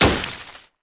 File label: snowball breaks; Angry Birds Seasons